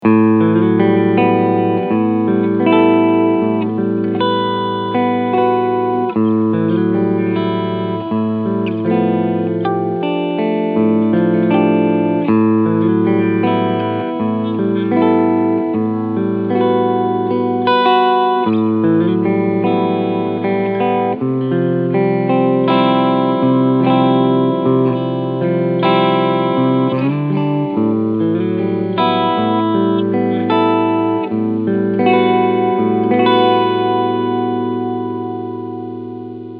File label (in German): Heritage Guitar H-150 E-Gitarre Clean Sound beide PUs; OSB